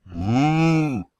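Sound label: minotaur random, mobsounds; server-nalc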